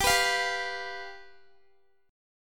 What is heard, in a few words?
G 7th, Guitar; strummed; x,22,21,22,19,x; 4E,4A,5D,5G,5C,6F